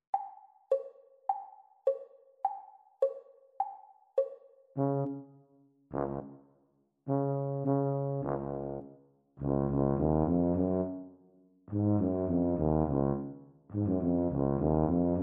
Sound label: Eb tuba duet exam speed; Grade 5